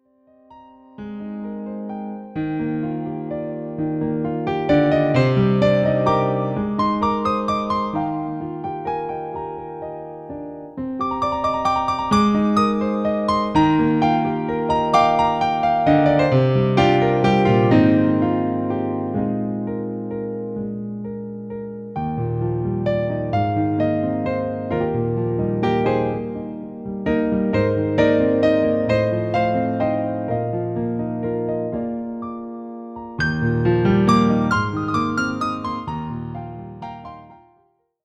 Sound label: Classical Piano